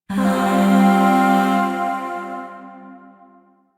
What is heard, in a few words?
Shawzin Pent Min Chord G; Music; Chords; Warframe; Zariman Void Shawzin; DE